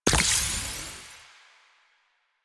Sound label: UI Mod Hold To Fuse; DE; Warframe; Mods